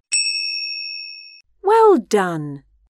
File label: correct